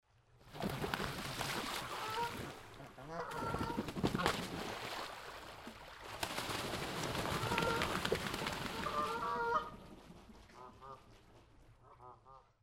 geese fighting flapping around flying by

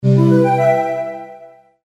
toad music

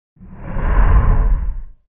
creature growl; Звуки монстров; Монстры

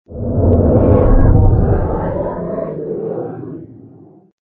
log off; Страшные звуки для монтажа

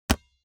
hand receiving a basketball

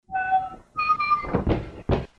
door locked

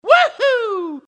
woo hoo

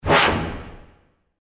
wipe; Страшные звуки для монтажа

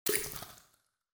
water drop splashes in cave

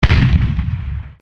bullet bill blaster; Звуки из игры Марио

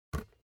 catching a basketball

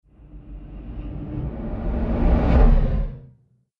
ghostly whoosh passing

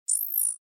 interface classic zoom

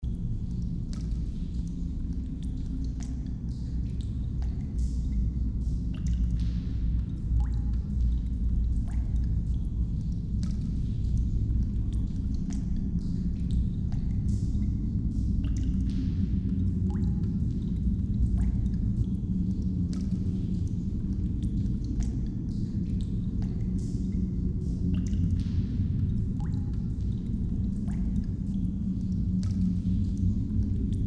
damp cavern ambience

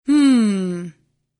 female hmmm

